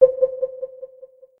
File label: Echo ping